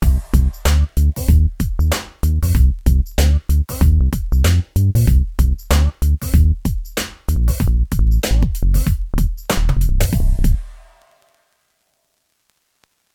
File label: Signature Sound; FXHome; Signature Tracks